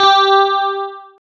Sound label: GUI, E-Guitar